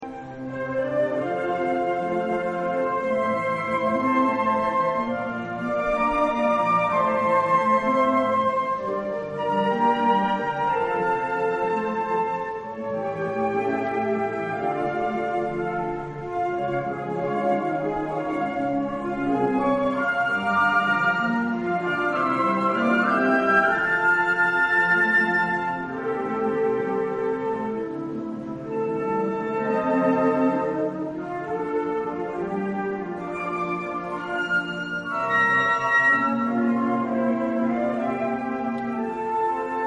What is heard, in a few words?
concert, flute